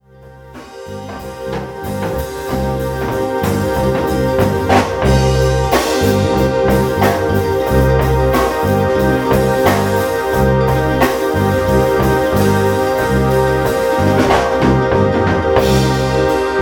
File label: drum song